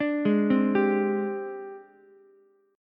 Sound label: Ab Major 7th Flat 5th Ab, down, Ukulele; arpeggiated; JGuitar